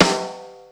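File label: snare ring, Yamaha Motif ES drum machine; 300 Drum Machines; Yamaha Motif ES-Series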